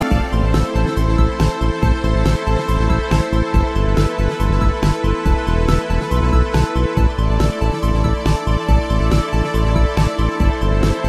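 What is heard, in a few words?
Music Sand; Wingforce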